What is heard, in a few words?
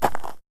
gravel, footsteps